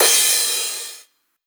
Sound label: Cymbal, 909; Slate; Vintage Drum Machines Volume 3 909 Edition